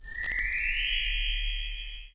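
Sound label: level up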